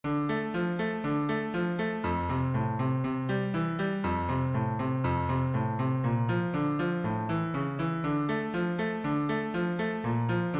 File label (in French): accomp mini-arpeges; ouragan